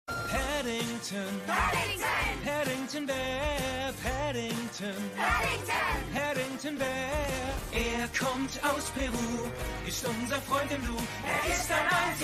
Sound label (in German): Gesang Titelsong